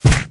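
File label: ram attack hit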